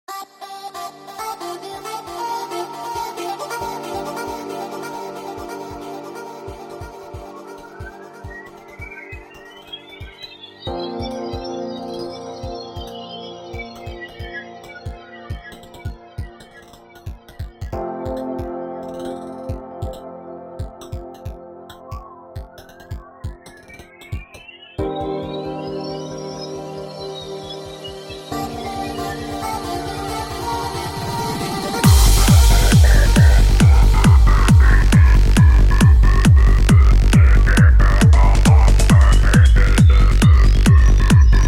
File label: Trance